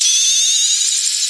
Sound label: hunter flechette pre explode